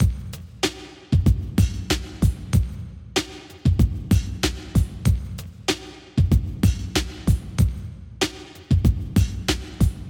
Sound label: Hip Hop Beat 95bpm